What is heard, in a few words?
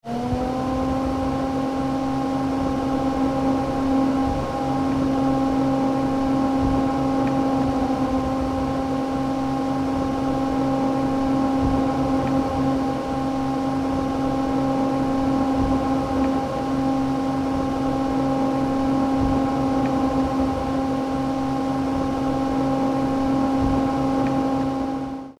Electric fan blade spinning very fast speed; appliances